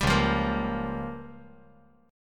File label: Db 6th Flat 5th Db down; Db6b5 strummed; JGuitar